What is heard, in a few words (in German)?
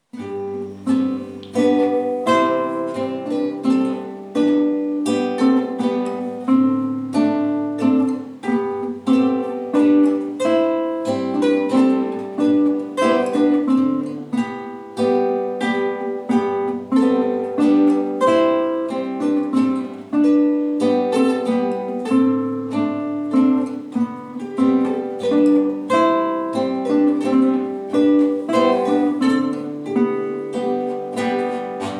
Menuett Gitarrenensemble